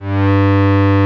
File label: ME ST G2, ANA STR